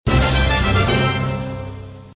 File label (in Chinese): 完成一套卡牌收集成就bgm